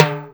Toms; Percussion; Mutekki Media; Vengeance Electroshock Vol.2

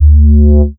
Bass Shot D; Mutekki Media; Vengeance Electroshock Vol.2; Bass Shots

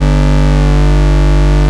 PHASE G1, BASS; Loopmasters; Jack Sparrow; Deep Dubstep Progressions

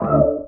D VOX STAB; funky; Loopmasters; Funky Underground Vol 2

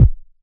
KICK, DRUM HITS; Loopmasters; The New Sound Of Dubstep

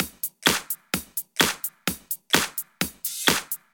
Loop, 128 BPM

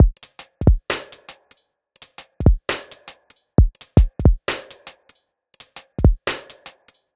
Bpm134 Lo-Fi Break, dubstep drum loops

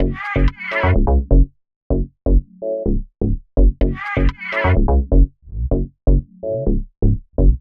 126 F# COMBI GROOVE, funky; Loopmasters; Funky Underground Vol 2; COMBI GROOVES

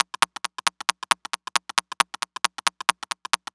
Bpm135 Plucks Perc Loop; Perc Loops

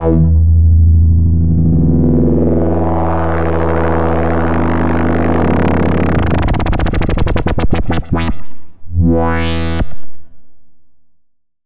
FX Misc; Mutekki Media; Vengeance Electroshock Vol.2

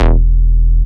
Bass Shot E

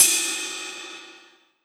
Ride, Cymbals

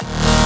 Bass Shot D#

